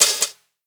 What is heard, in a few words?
hat lofi, Open Hat; Hip-Hop; Drum One Shots; SD CAICOS Hip-Hop Sample Pack; Slate